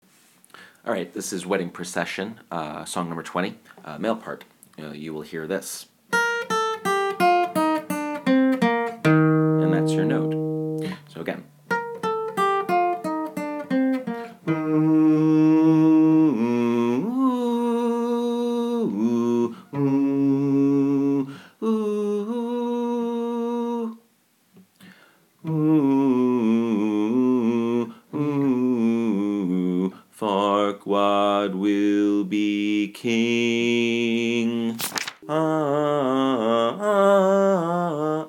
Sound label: wedding procession bass